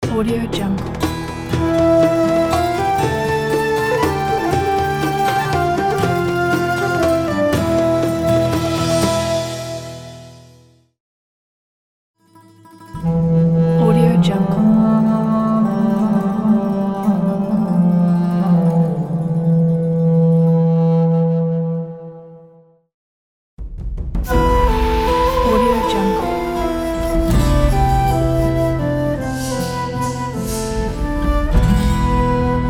arabian theme logo pack

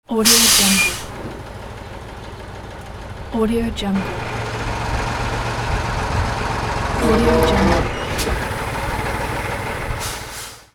truck

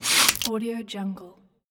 snot

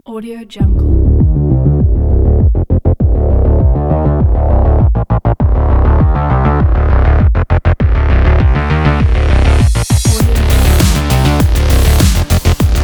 energetic sport breakbeat

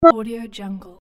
soft beep

